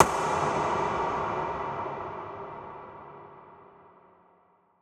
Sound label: Percussion Hit